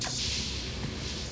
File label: door